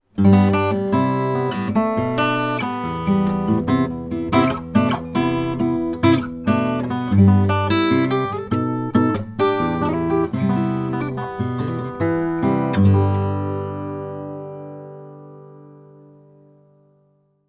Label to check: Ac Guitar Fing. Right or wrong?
Right